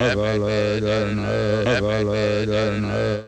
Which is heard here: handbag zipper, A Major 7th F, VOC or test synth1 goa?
VOC